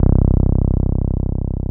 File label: TB-303